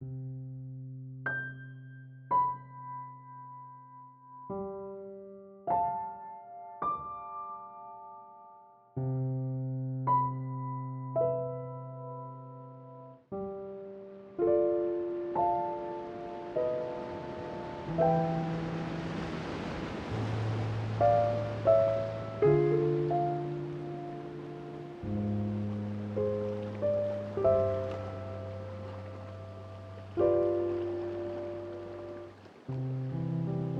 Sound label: ambience General; PIXIGSLOT; netent